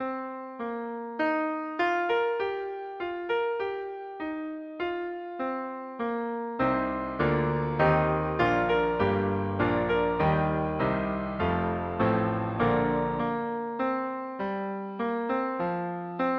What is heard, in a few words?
saxophone